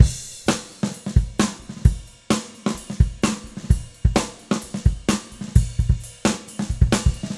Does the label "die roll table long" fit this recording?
No